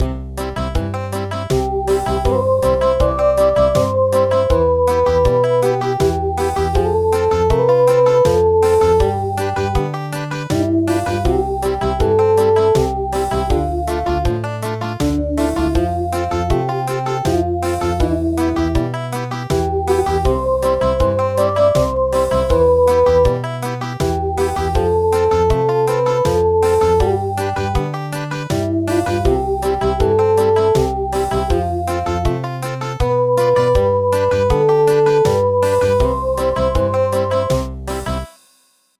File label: Country, midi; Serenade on the mountain